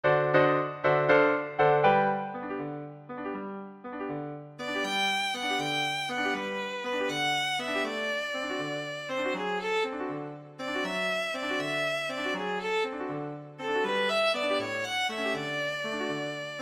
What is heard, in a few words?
wandering, violin